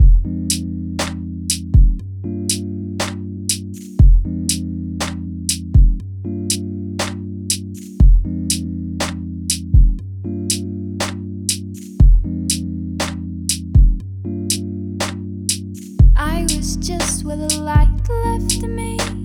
chillout downtempo mix; Ethereal Echoes

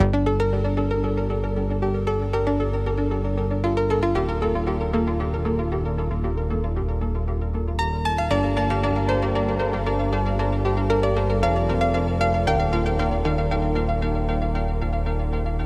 Electronic Impulse

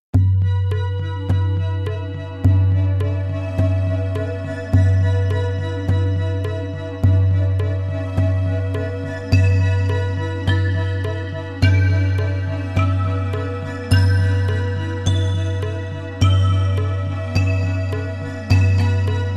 spa music, 432 Hz

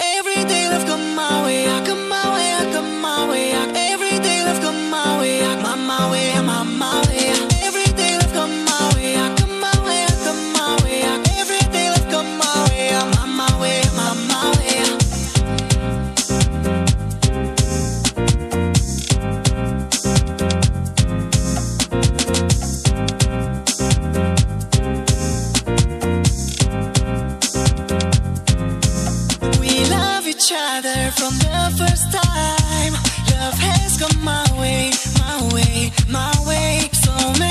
Electro Vibes

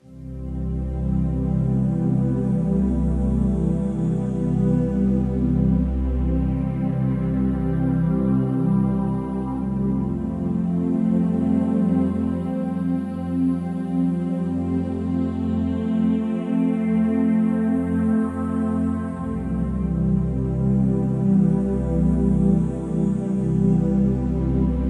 Soothing Harmony

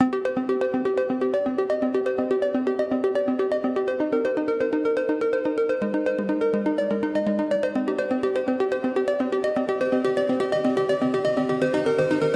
Electronic Impulse

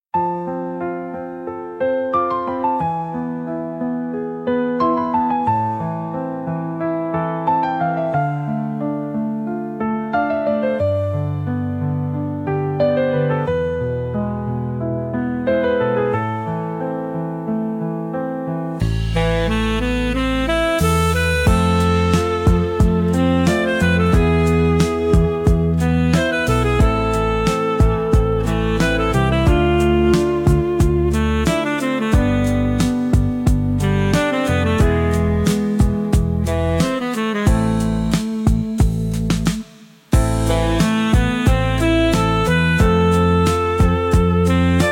sax serenity

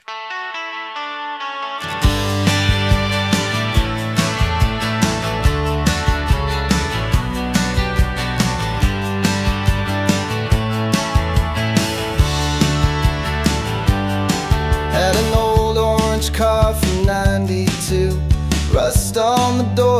jangle pop

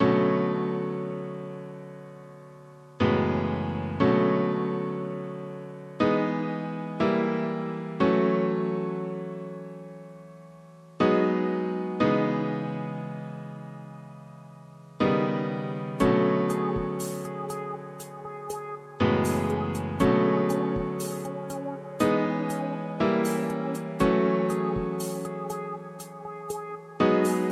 smooth jazz cafe

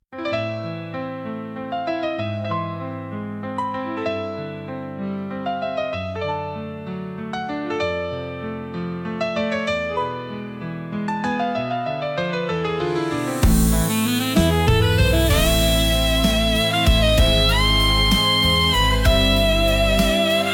sax and soul

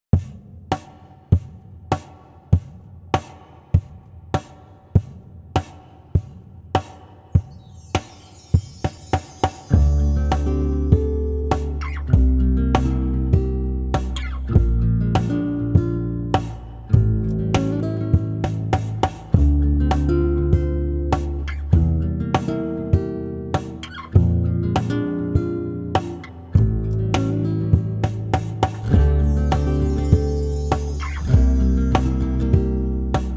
flamenco vibes 432 hz

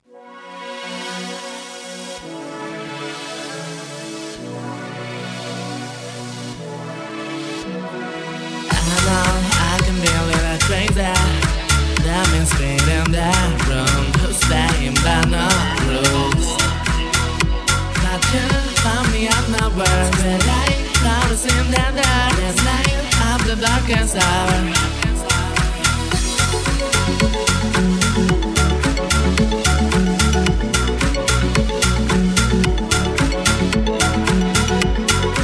Dancefloor Groove

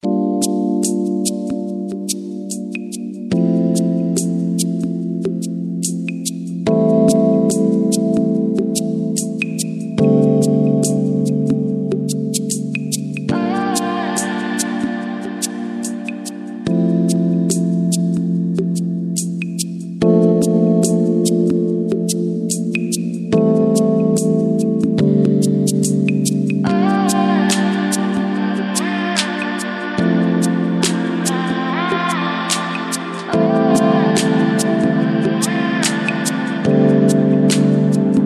Chillout Vibes

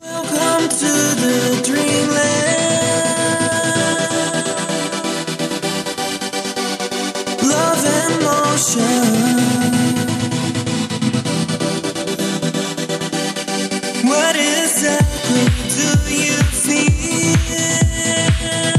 Disco Chill Vibes

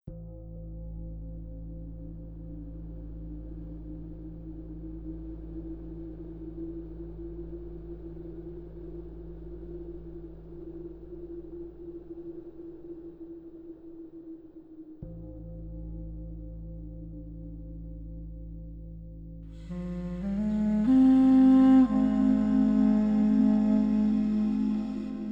flute 432 hz; nightwalk

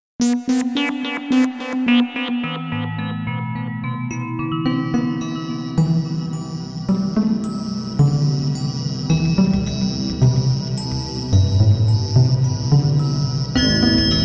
spa music 432 Hz

